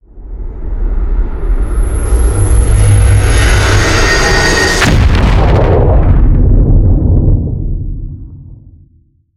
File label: spatial bubble rupture; ambient; jupiter